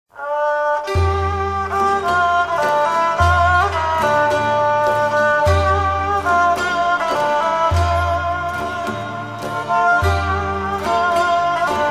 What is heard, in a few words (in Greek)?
Θούριος Ρήγα Φερραίου; εκδοχή Δ.Σούτζου